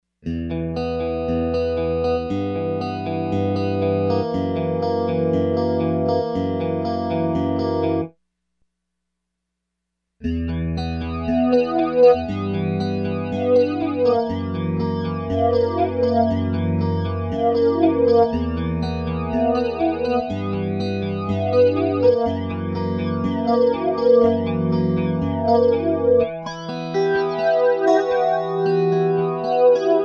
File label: STEREO FLANGE